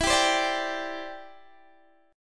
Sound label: E Minor 7th Sharp 5th, down, Guitar, Open D